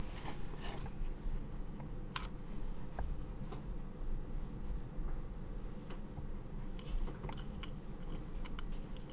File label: scream